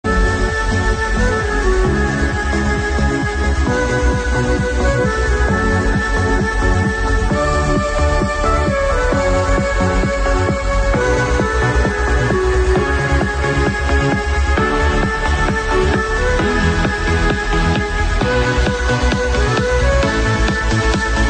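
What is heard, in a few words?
Klarinet trance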